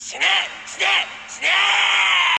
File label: screaming; Bakugou